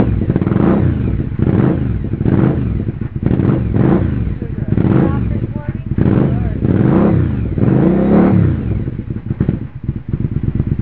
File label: Exhaust